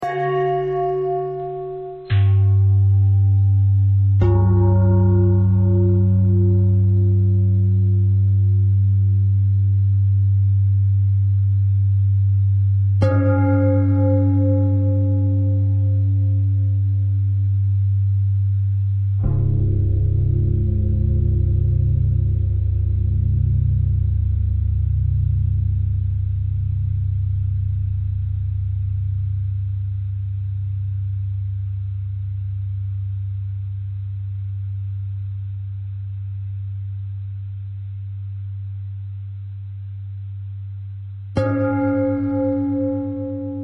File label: bell bell thread simple, bells